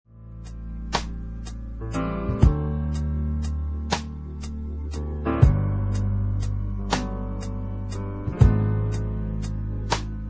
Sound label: instrumental